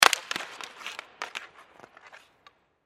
Hockey Face Off, Hockey Face Off Sticks Hit; Blastwave

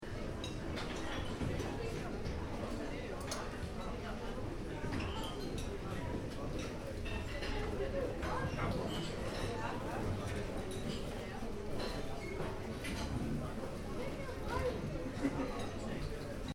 Interior cafe; Stuart Duffield

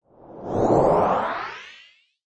Scifi Production Element Rise Up

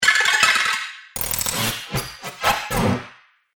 Robot Factory Noises; Robot Sound Effects

